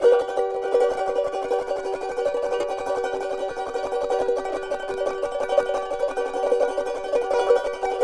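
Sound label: charango G# major tremolo; Spectrasonics; Hans Zimmer Guitars VOL-2; CHARANGO CHD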